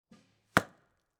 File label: arrow into target